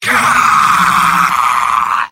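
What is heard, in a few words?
sniper pain critical death; vo; tf2; mvm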